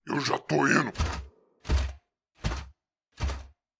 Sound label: Black Knight Walk; Black Knight Walk 4